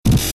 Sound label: laser